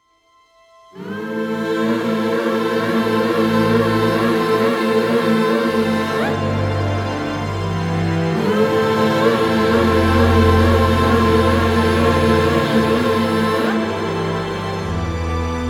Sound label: Fall of the Hero - Instrumental